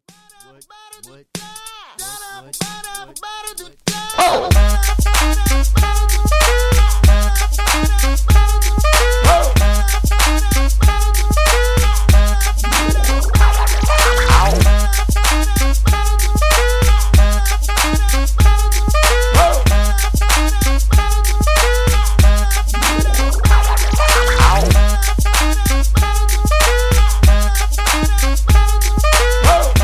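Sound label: instrumental, hip hop ringtones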